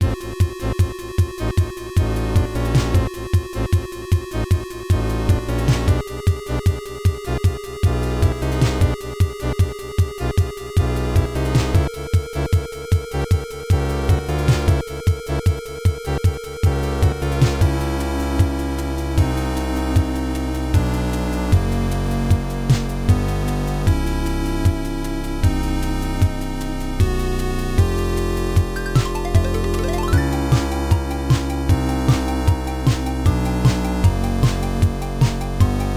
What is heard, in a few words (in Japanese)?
WATERパイプ (FM音源版)